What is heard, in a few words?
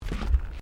Footsteps Decking Wooden Step